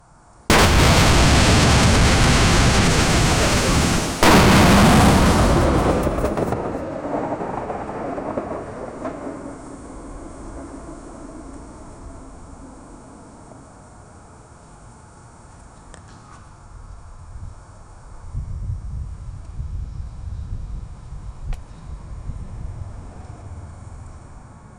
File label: ak 47 fire in sky